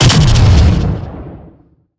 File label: Banshee Missile Destroyed; Evolve; Elder Kraken